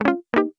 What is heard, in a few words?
jingle